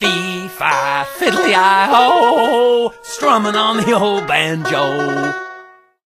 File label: Banjo Pickin; Out from Boneville